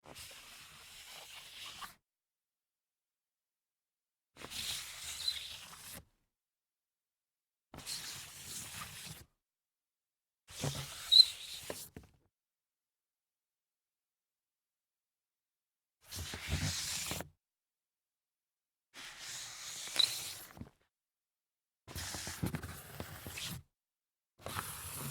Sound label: sneaker foot slides hollow wood